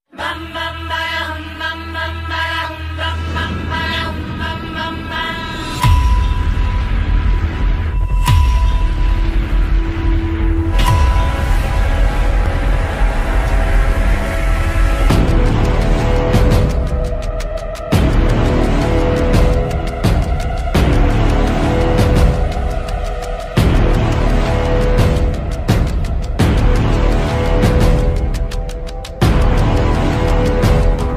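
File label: background music ringtone; ravi taja; eagle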